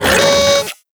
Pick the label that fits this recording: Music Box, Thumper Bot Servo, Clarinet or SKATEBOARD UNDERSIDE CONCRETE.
Thumper Bot Servo